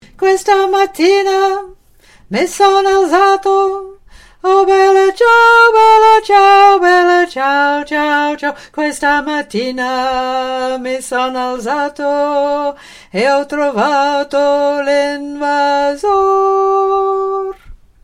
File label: Bass; Bella Ciao